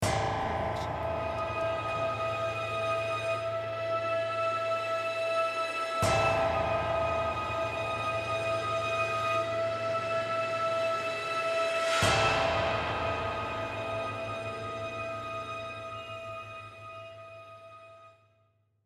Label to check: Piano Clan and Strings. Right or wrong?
Right